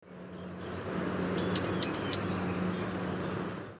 suara ciblek

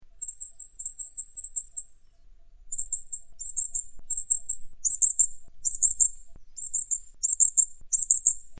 serindit india

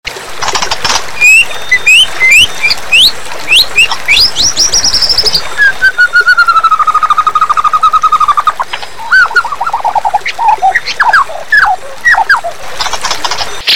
murai batu isi cenggot kapas tembak cililin

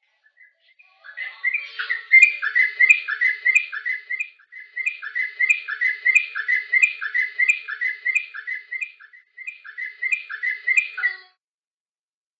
trucukan ropel

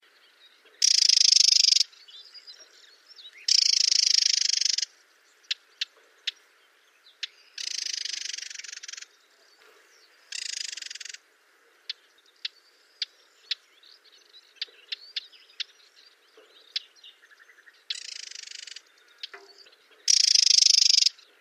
suara call warbler kacamata

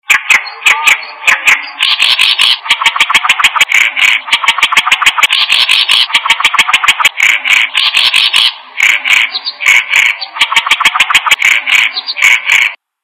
burung asing

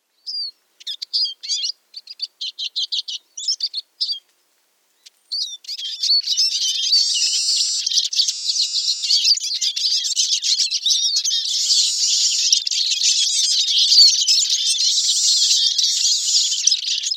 suara siskin eurasia

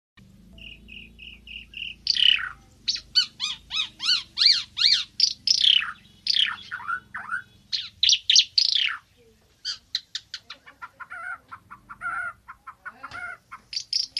suara burung ara timor

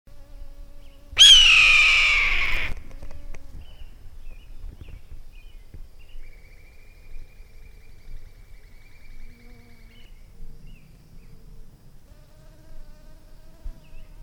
suara elang ekor merah

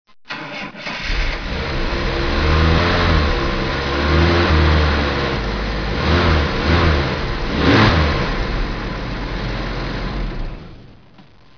suara mobil

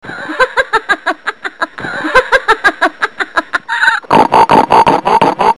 tertawa lucu

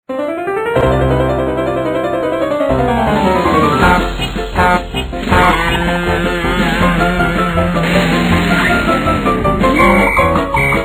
konser bayi

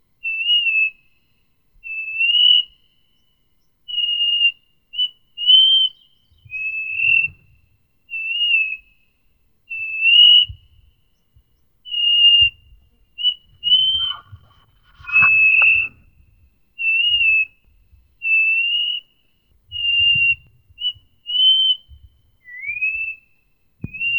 suara cingcoang sulawesi

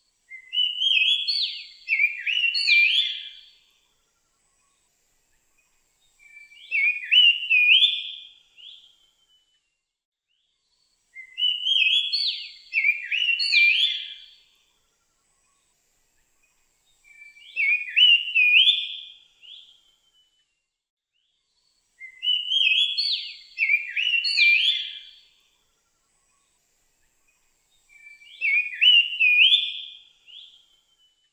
pelanduk dada putih

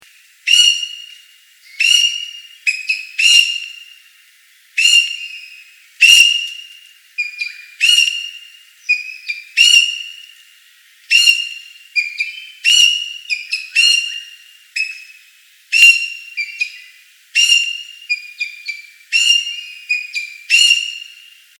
suara burung ekek layongan atau cissa chinensis

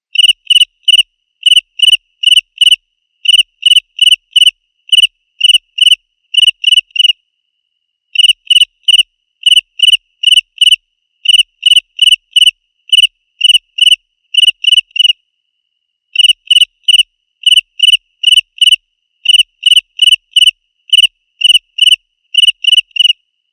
jangkrik

